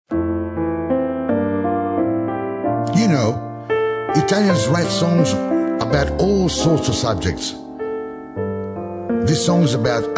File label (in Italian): Il Clarinetto